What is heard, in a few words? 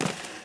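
Wood Slide Small; objects; Star Wars Obi-Wan; XBOX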